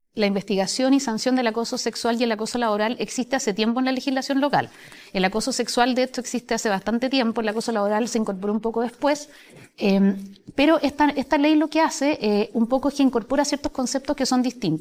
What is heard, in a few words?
vocals